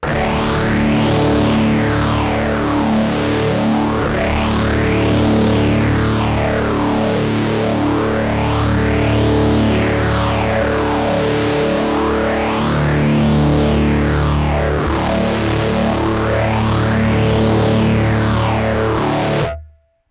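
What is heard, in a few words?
Rat guitar, Moogerphaser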